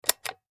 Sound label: Push Button Plastic; buttons